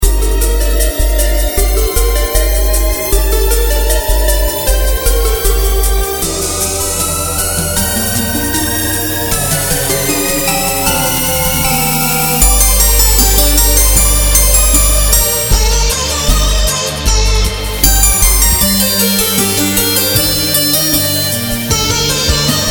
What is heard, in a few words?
boss battle, wacky wicked